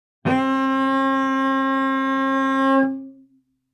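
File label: Cello arco fortissimo sul G Db4; MIS Pitches - 2014; Strings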